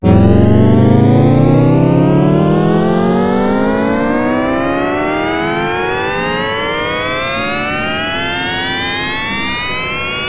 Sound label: Sound Effect Tornado Spin; Taz-Mania Genesis